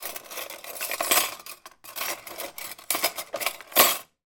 household cutlery movement on draining board

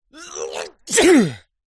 human male sneeze

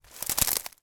footstep into bushes; foley

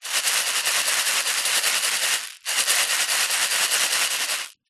leisure fuse beads shake in plastic lid

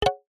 multimedia ui tone short plucked nylon

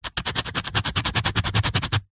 foley foam dense scratch sharp object

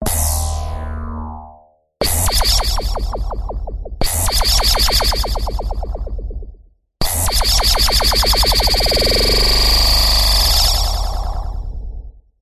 science fiction teleport several beam bursts; audio mangler

